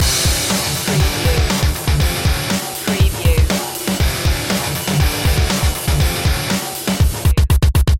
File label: heavy metal showdown loop